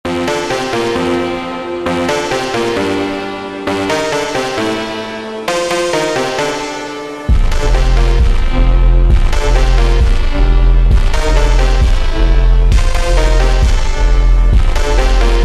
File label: Christmas Phonk slow Jingle Bells Music Remix